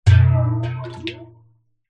industrial metal canister large containing water hit bang against object